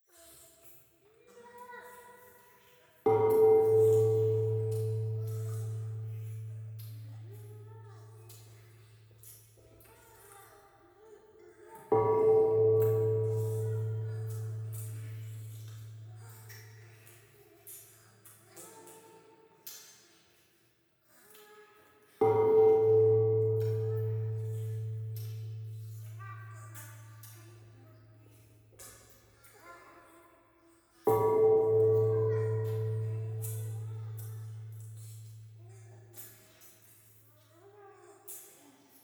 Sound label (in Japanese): 風呂 イラマチオ 神社